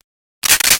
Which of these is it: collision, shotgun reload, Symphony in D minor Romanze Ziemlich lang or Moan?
shotgun reload